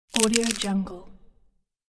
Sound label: thin crack break